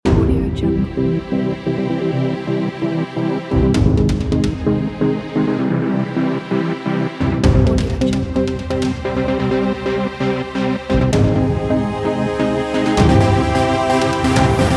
media ident pack

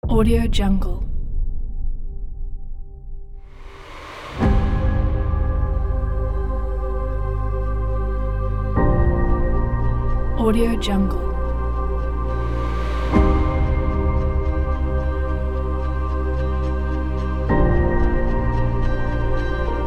emotional piano choir vocal trailer

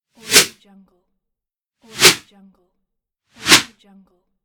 the transition swoosh

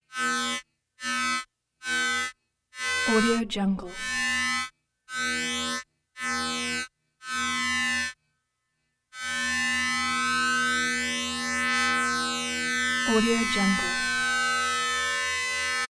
synth tone buzzy wavering phasey

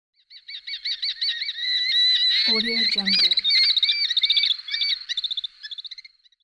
falcon

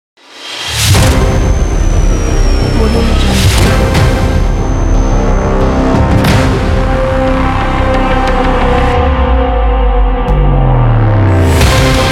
epic trailer metal rock; punchcakestudio

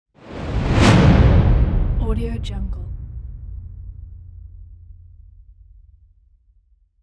epic title

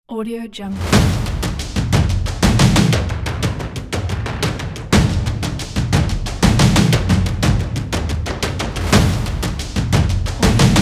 the epic cinematic drums

